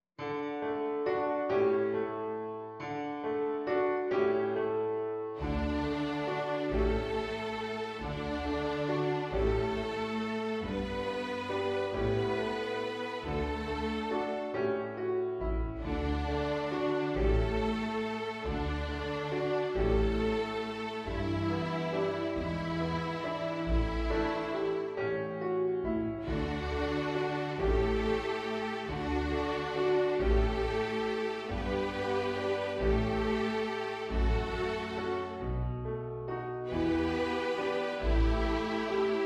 Sad waltz

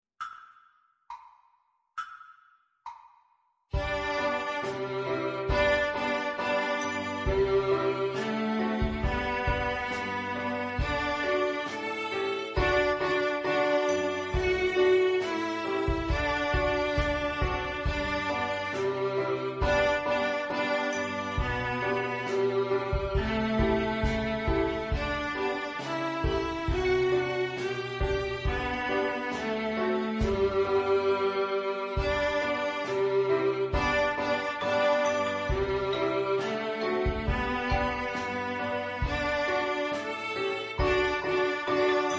Woodpecker viola; heypr for viola bk 2 silver

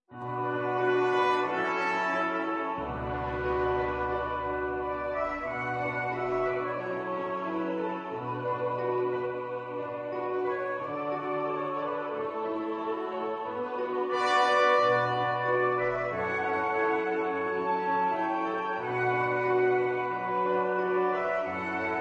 Legend accompaniment, violin

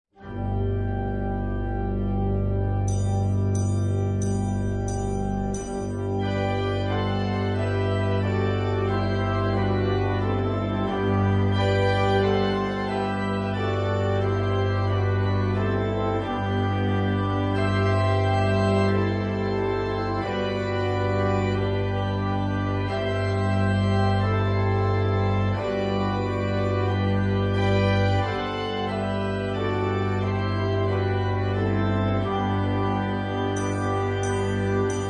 Chimes accomp, viola

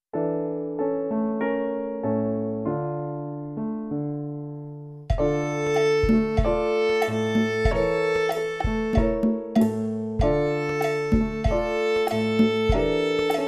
Slow tango

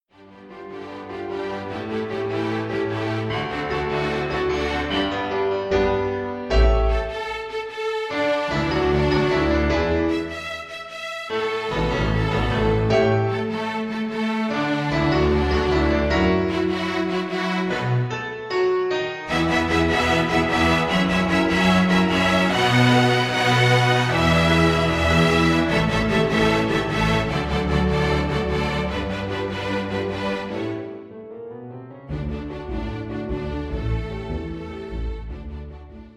FIDDLE

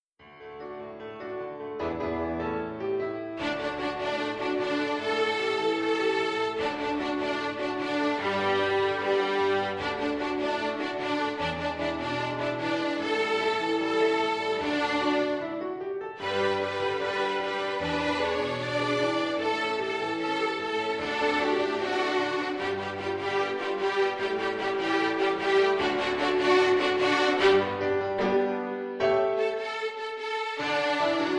FIDDLE

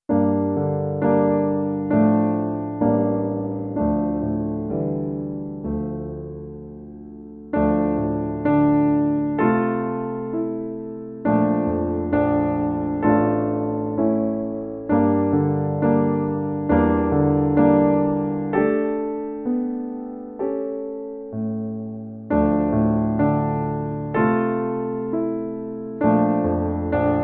Im so blue accompaniment, violin; hey presto for violin book 1 bronze

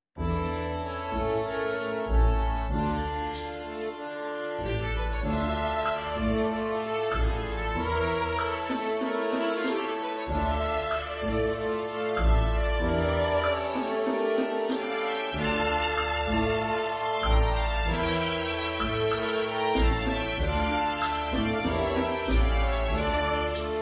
Slow Tango accomp, viola; Hey Presto for viola bk 1 bronze

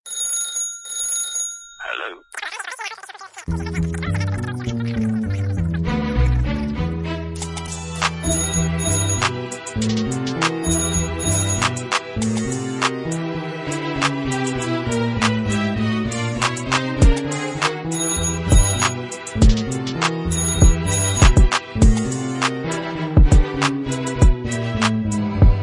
Telephone accompaniment, violin

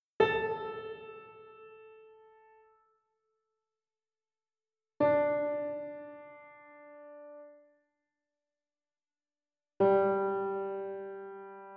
Tuning track VIOLA

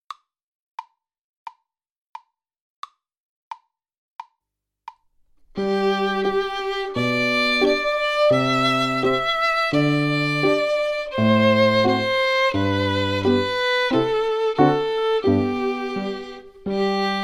Position page Twinkle in G major

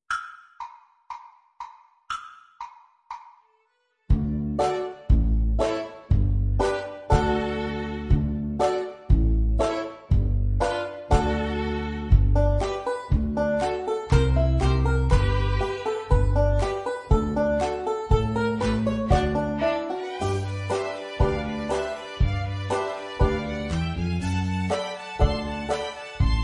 accomp, viola; heypr for viola bk 3 gold

